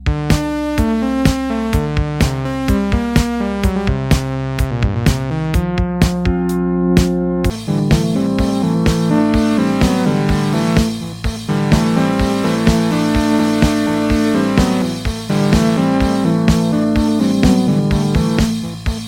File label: key sawtooth brass